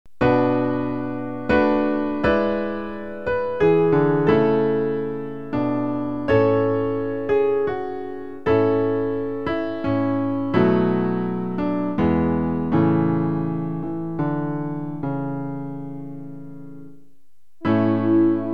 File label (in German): Klavier-Playback; Stille Nacht heilige Nacht